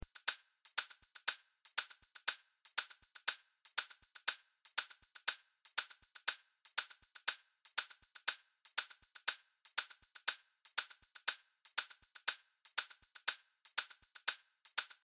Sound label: wedding day uplifting marriage celebration